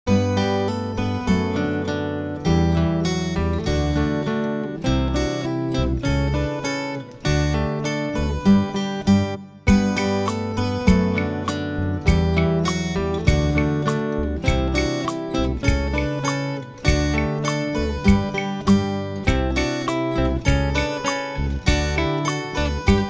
Instrumental

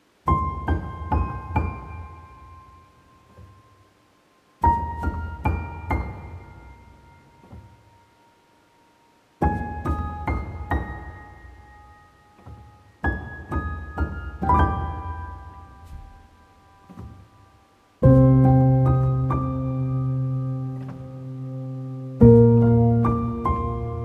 Sleepy Version, Piano Version; From Up On Poppy Hill

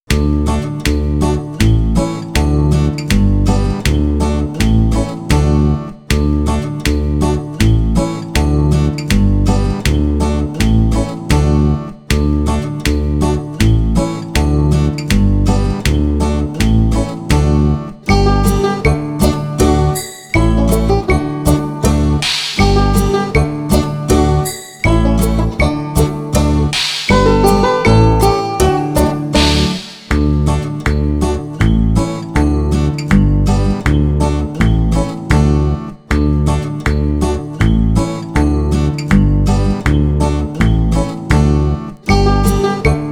Instrumental

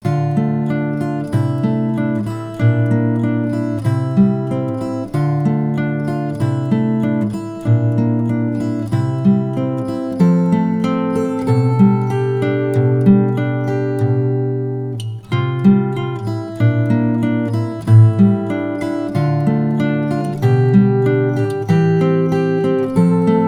Instrumental

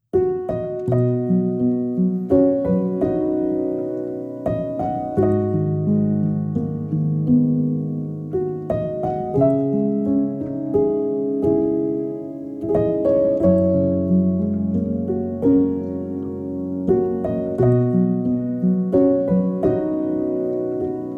Piano Version; Howl's Moving Castle

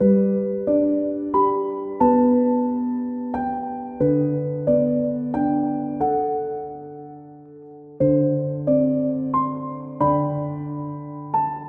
Rock A Bye Baby (Piano)

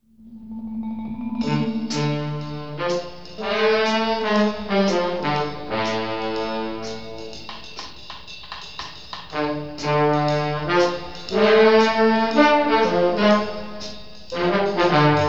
Instrumental; Disney

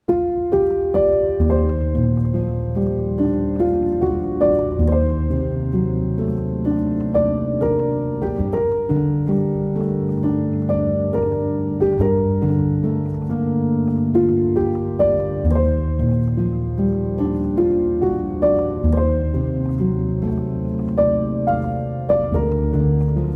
Piano; Ponyo On The Cliff by the Sea

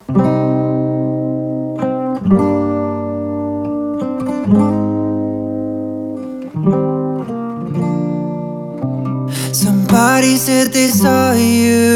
Acoustic Guitar Version

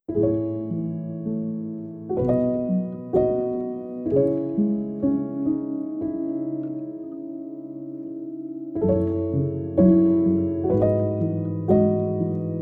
sleep piano; Princess Mononoke

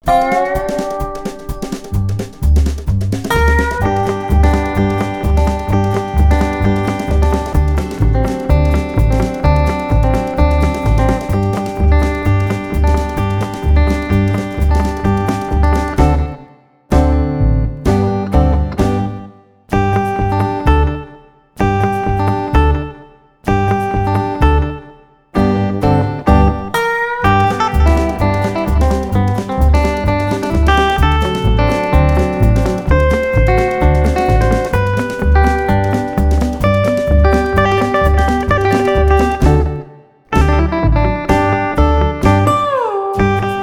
Instrumental

